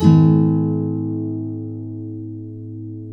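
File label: nylon up minor A#, guitars, flam chords; Spectrasonics; Hans Zimmer Guitars VOL-2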